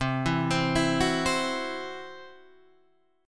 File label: C Major Flat 5th, C, down, Guitar, Standard; arpeggiated; CMb5